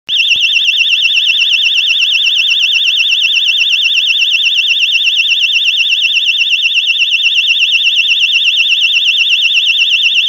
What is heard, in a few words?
SIREN